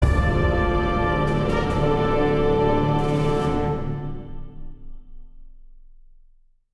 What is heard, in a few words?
US Defeat Short; battlestations pacific